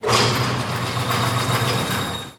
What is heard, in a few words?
Crane Fail Pick Up; Still Life; windows